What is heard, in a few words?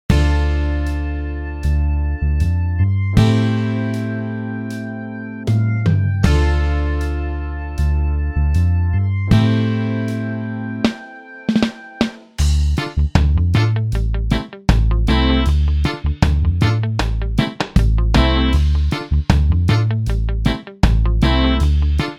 reggae; rock and pop